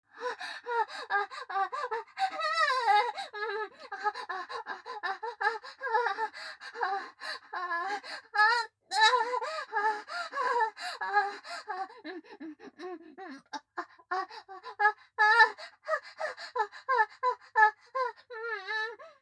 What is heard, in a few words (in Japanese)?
正面近い・喘ぎ声・激しめ; ミーシャ